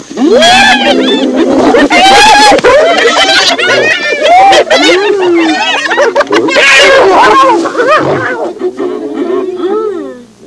nuthouse, People; Sound Library 2000; Wayzata Technology

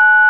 TONE

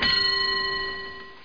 tak coin

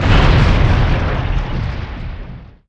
Hit Level; WoTDemo; Angreal

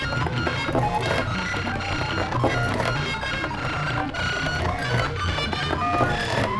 computer; CHAOS DEM

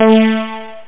digipop; simon

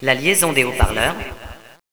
kit; Villagina; ecole

